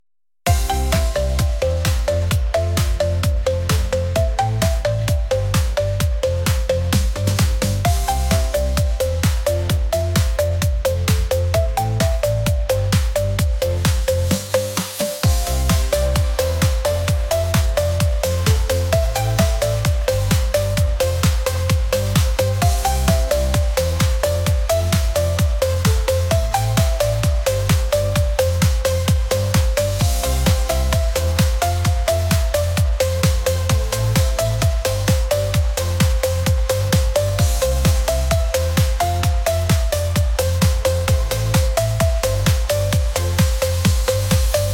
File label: Dreamy electronic pop with lush production and catchy melodies